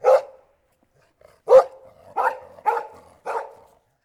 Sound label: dog distant; DeadFright